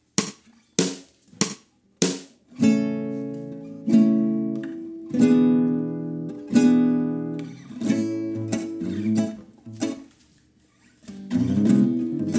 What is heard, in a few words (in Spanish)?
Dos guitarras